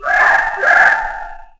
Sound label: Cory Warn, Dino